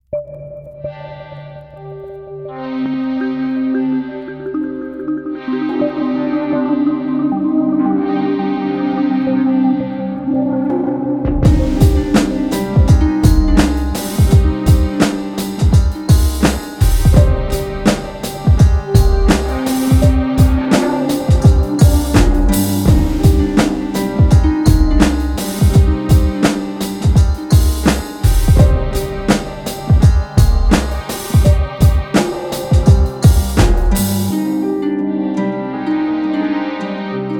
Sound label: Afro beat